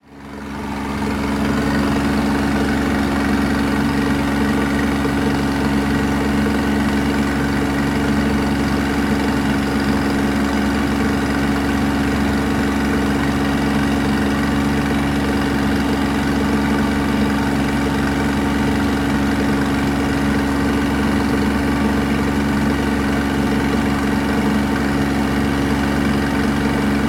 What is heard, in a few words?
LONGTAILBOAT, MOTOR RUNNING, HIGH SPEED, THAILAND, KHAO SAM ROI YOT NATIONAL PARK; HUA HIN; HIGH SPEED TO STOP; creativeaudiopool; AUTHENTIC THAILAND SFX-VOL 4